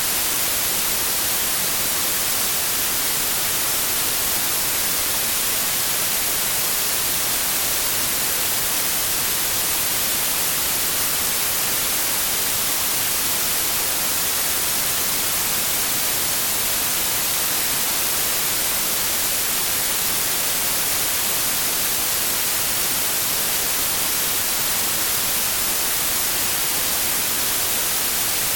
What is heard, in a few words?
pink noise